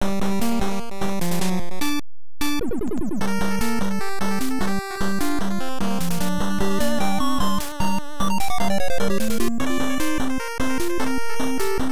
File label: Battle; Destiny of an Emperor; NES